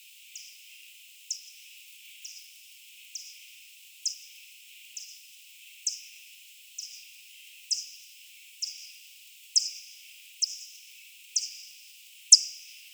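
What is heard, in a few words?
Pipistrellus maderensis, Tenerife Erjos; J-Barataud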